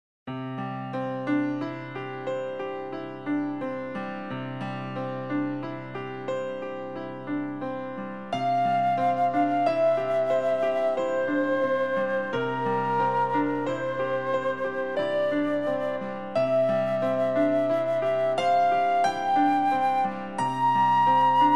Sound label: Easy Listening & Classical; Sound Ideas